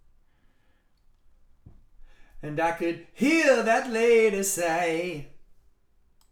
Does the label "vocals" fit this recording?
Yes